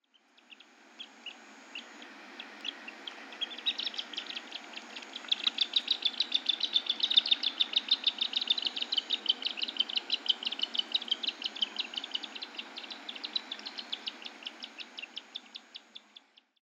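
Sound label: Black-winged Stilt, hyogo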